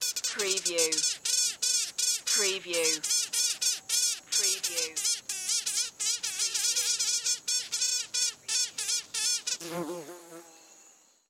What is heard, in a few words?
strange insect noise